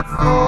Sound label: D STAB, Funky Underground; Loopmasters; Funky Underground Vol 2